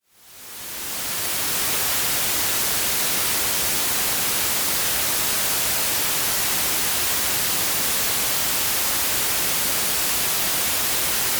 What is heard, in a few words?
noise white